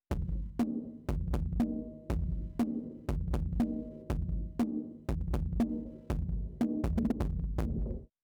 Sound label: Sub Distortion